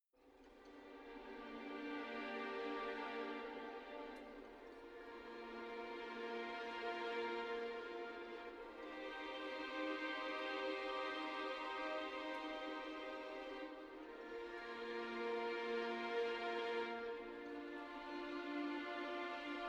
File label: strings high